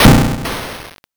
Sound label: thunder magic